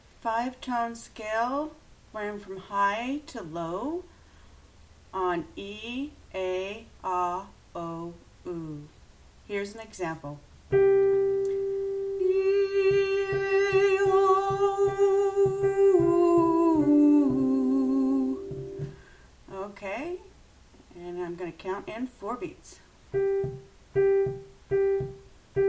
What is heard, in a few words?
five tone scale going down tenor